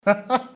risita

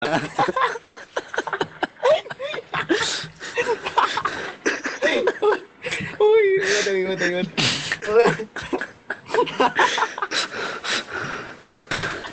risaaa

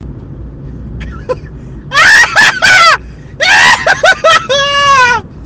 grito

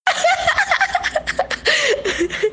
risa

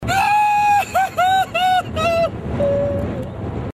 grito

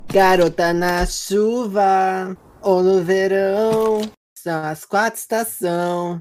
cantando

